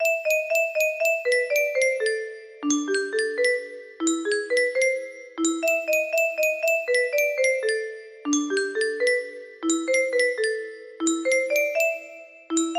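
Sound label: music box melody; fur elise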